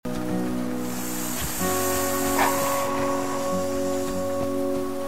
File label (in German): schlange; The Flame in the Flood